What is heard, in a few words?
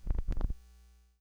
Needle Pop, Vinyl Record Samples; Needle Noises